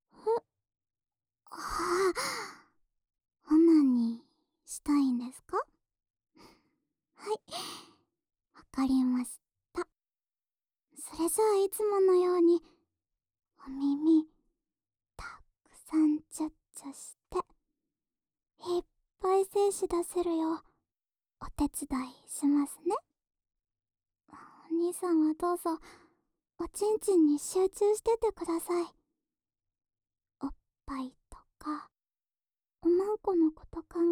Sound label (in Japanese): オナニー応援耳舐めループ（左耳）